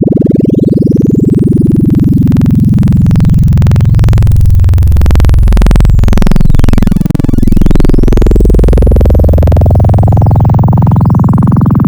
Sine Sweep I, Wavetables; Cymatics; Wavetables Collection Vol 3; Serum

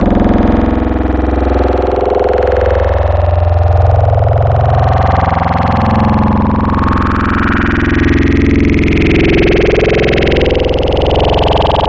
Growl Wavetables; Bands Rejected; Cymatics; SERUM; Virus Wavetables

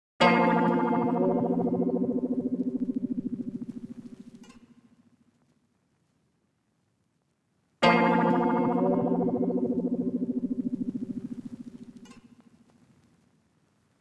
126 BPM A# Min Accent; Cymatics; Slime; Destiny Gold Expansion

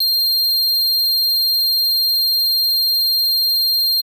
square, 808, SubOsc

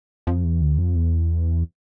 Bass, Hip Hop Exotica, 088 Emin

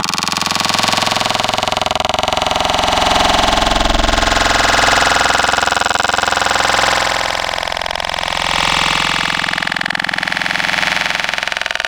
Thin Growl, Serum Wavetables; Cymatics

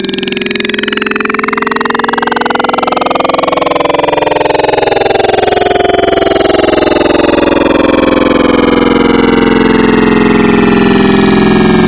Backwards; Cymatics; Wavetables Collection Vol.2; Serum

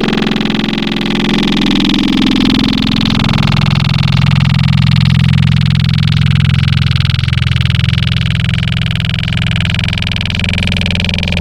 Growl Table, Serum; Cymatics

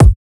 Kick Hit; big fish audio; Hip Hop Exotica; Cmin

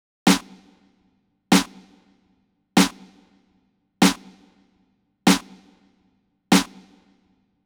Snare, Drum Tracks, Amin; Hip Hop; big fish audio; Hip Hop Exotica; Apple Loops

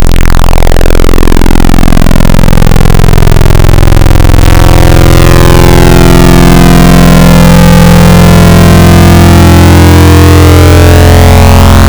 Sounds Chordal, FM Wavetables; Cymatics; Virus Wavetables; Serum

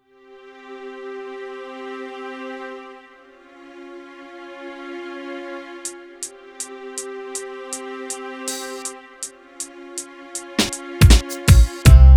080 Cmin, Hip Hop Exotica

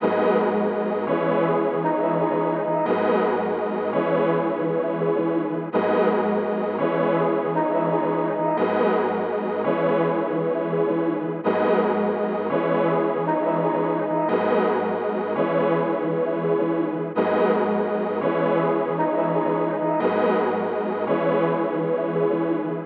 168 BPM G# Min Chords; Cymatics; Destiny Gold Expansion Stems; Balenciaga

